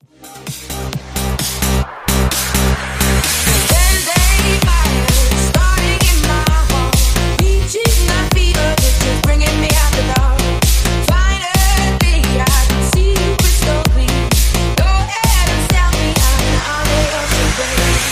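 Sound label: 130 bpm C minor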